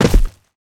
footsteps land grass; player

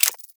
radial menu buy, ui; Counter Strike Model Pack; CS:GO; panorama

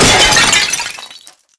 break ceramic large; physics; breaking

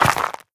scuff, footsteps, player, dirt

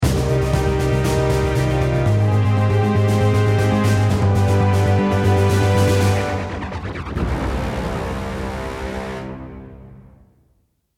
round mvp anthem

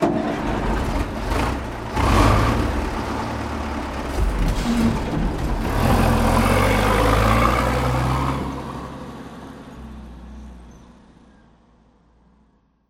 truck round up engine; scripted sequences

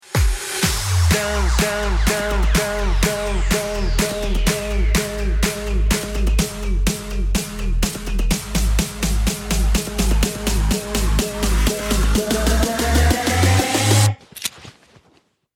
bomb ten sec count

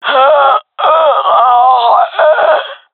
death, jungle fem; vo; agents; Source Model Packs; Counter Strike Model Pack; CS2; jungle fem epic

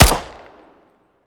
bizon, weapons; Counter Strike Model Pack; CS2

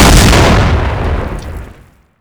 explode, grenade; Counter Strike Model Pack; CS:S; hegrenade; weapons

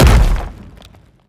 bash medium, brickwall, destruction

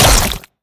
bite, headcrab

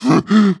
breath short, zombie

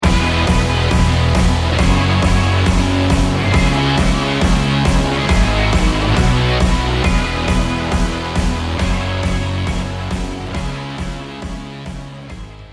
start action, music

